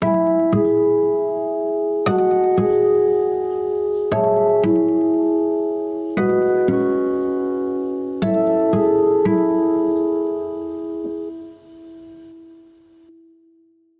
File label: cloudburst acoustic